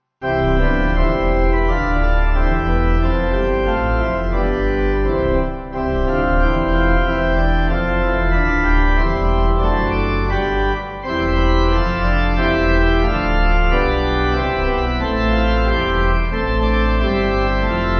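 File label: Pipe, hymn backing; Lord God Almighty; Kein Tag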